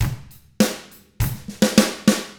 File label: ROCK, Drums; ILIO; Double Platinum Drums 1